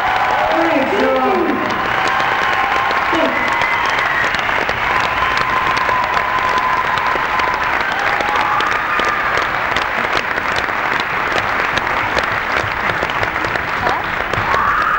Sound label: crowd